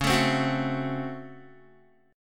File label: Db Major 7th Suspended 4th Sharp 5th Db Guitar; 9,12,10,11,9,x; DbM7sus4#5 strummed; JGuitar